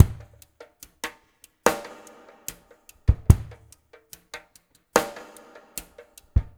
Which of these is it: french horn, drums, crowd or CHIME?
drums